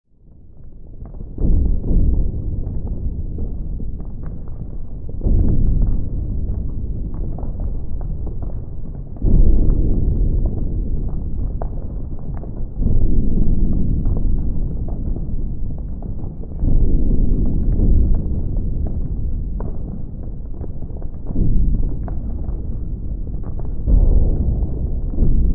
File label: Warfare World War Two Battlefield Distant Gun Shots Explosions; WWII; Gun Shot Sound Effects